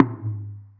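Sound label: TOM soft; Zero-G; Chemical Beats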